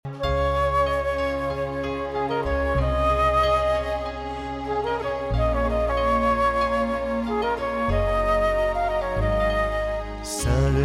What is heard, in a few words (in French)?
Sanctus polyphonie Chant